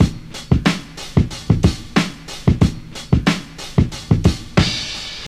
91 bpm 00s breakbeat e key, Free drum loop sample - kick tuned to the E note. Loudest frequency: 939Hz